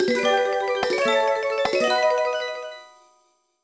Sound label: Jingle Aspect Change